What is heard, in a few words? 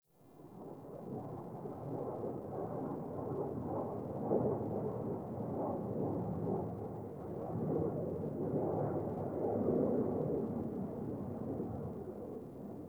Distant Ambient Fighter Jet; Warframe; Ambience; Nineteen Ninety Nine